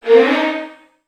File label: VN FX, Virtuoso Strings